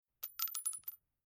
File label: Bullet 243 Rifle Brass Shell Light Roll Across Concrete Bounces Metallic Ring; Miscellaneous Combat; Miscellaneous Combat Sound Effects